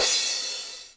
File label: Cymbals crash; Vengeance Samples